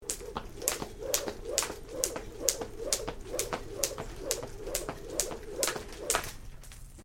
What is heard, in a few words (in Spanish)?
saltar la cuerda